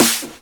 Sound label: snares; Vengeance Samples